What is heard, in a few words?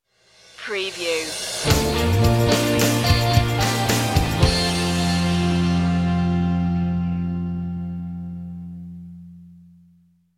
pop presentation stinger